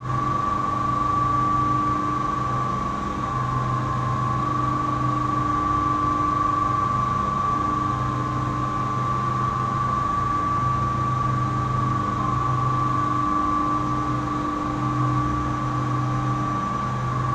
MACH, MACH N SM; Total Control - Action Cutz

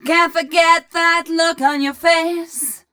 f mel a flat, fem Vocals

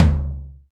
DM5 Tom Hi, Alesis DM5 drum machine

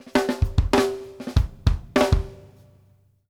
off snare, drum fills

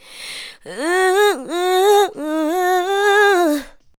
ADLIBS 90; Ueberschall; Jam Box

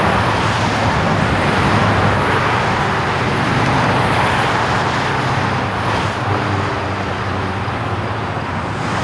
HIGHWAY, traffic; AKAI S6000 CD-ROM - Volume 6; Back Ground

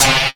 Trap Snare; Sonic Foundry (Sony Creative Software); Esoterik Beatz; Trap Kit

